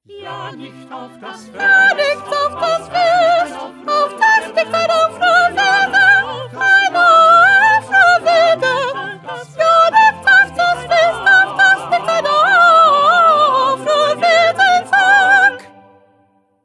2nd Soprano

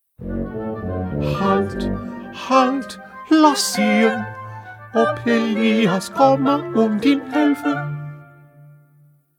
Bass; Bach; St Matthew Passion

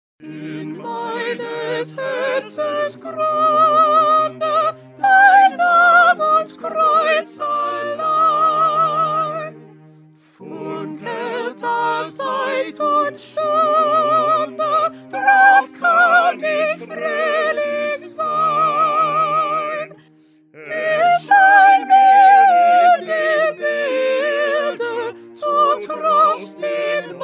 Soprano; Bach; St John Passion

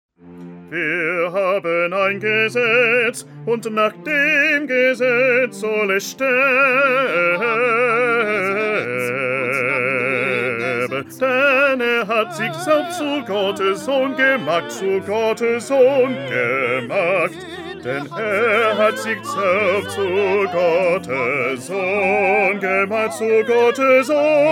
Bass; Bach; St John Passion

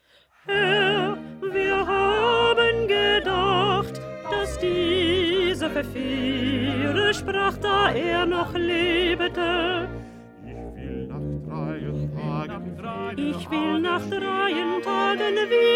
2nd Alto

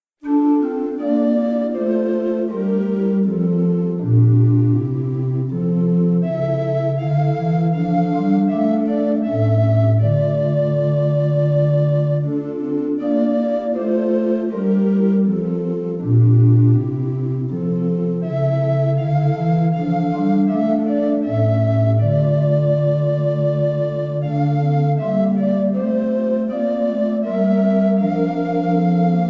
Instrumental; Bach-St-Matthew-Passion-3

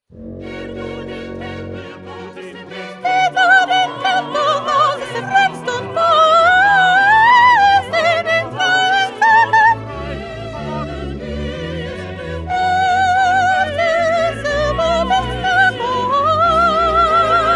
1st Soprano

